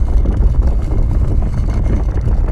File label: Scrape Heavy Stone Loop; Doors